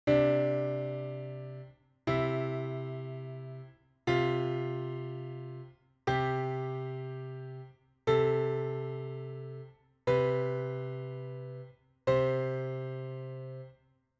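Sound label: all compound intervals from C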